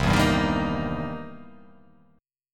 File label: C 7th Sharp 9th, C, down; strummed; chord; JGuitar